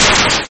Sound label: Short repetitive explosion